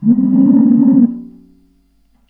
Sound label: Radiator, contact mic, Metalics